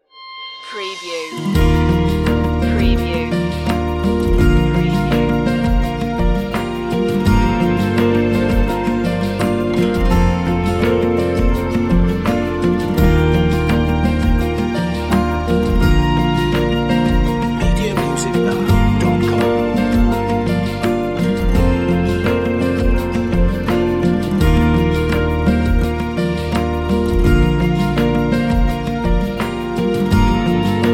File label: acoustic escape